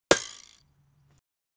baseball bat hitting; home

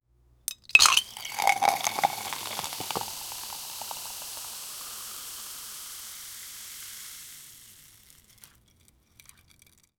pouring tea leaves into a

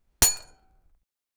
glass snapping; breaking

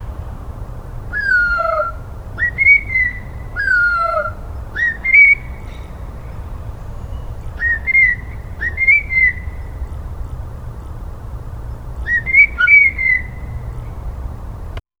forest sound bird s, deer

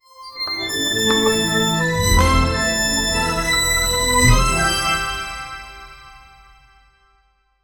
thrilling reveal moment, reveal

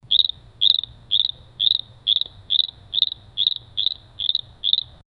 funny frog sound in a, amusing

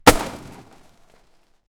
combat

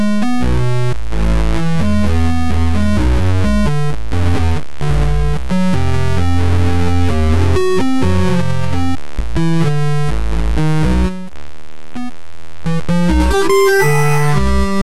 retro 8 bit slime

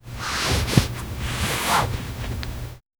gentle cloth on fabric

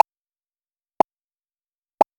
ui; 44.1khz

